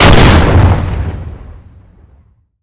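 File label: explosion flash bang; Modern Warfare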